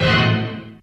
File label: collision, Stabs